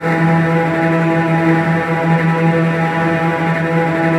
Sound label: Virtuoso Strings, TRM FX; ILIO; Kirk Hunter Virtuoso Strings VOL-2; 10CL TRM FX